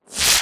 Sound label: imp bullet energy bubbleshield; Titanfall 2